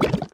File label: mud summon; wukong princess